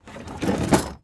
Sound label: Draw Open Full Of Silverware; household